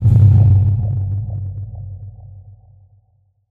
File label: Impact; impact samples